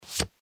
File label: cards reveal; poker game